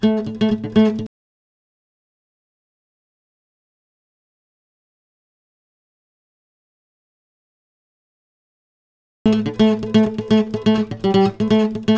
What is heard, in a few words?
125 Am Lead Guitar